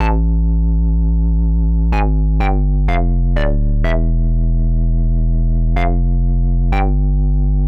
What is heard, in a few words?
Hard Synth Bass A, 125; PowerFX - Nu Jazz House